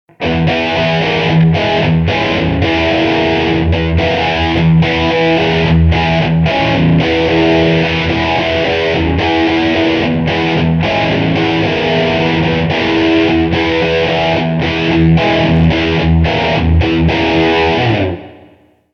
Artrock Riff

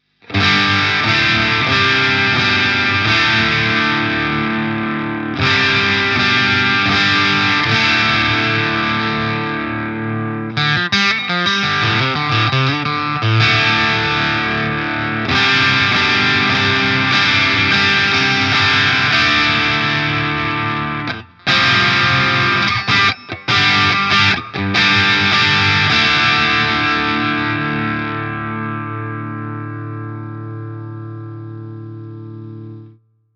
Stegtonabnehmer SC Rhythmplay crunch; Harley Benton MR Modern CAR

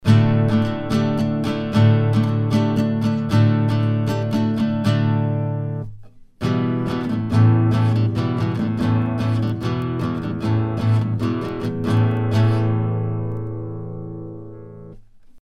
Sontronics STC 3x Niere Gitarre

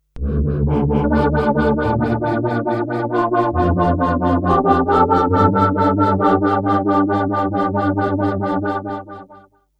Chorpatch mit LFO Filtermodulation

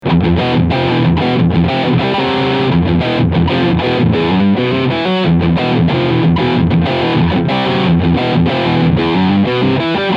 Overdrive Riff Steg

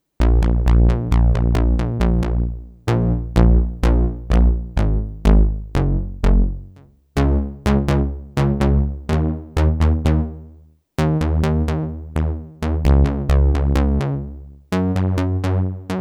System8 links vs JP08 rechts

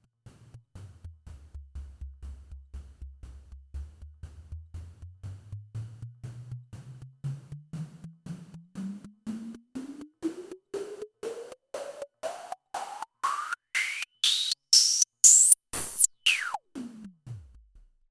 twin VC Filter BP Filter Eigenresonanz

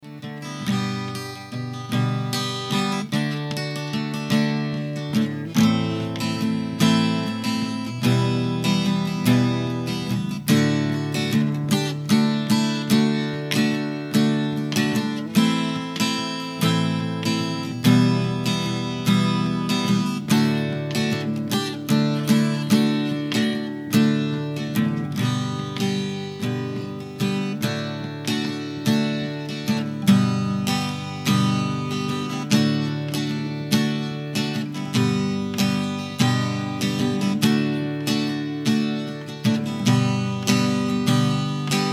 Akustische Gitarre

